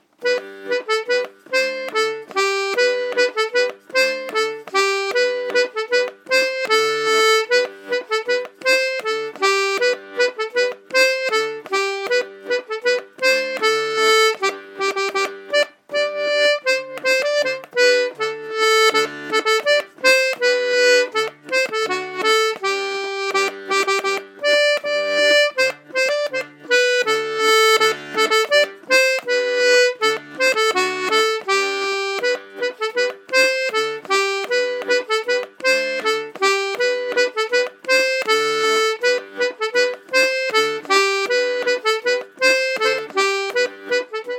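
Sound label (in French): hanter dro, accordéon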